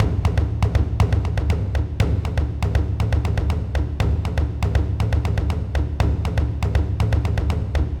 taiko loop 120 bpm

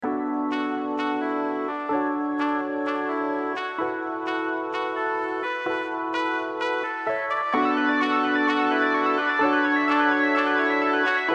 modern disco

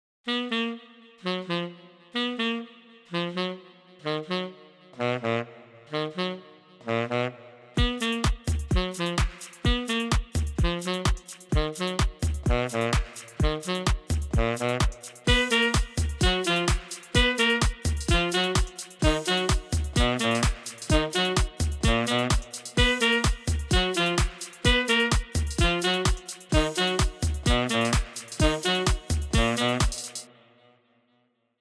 brass tenor sax

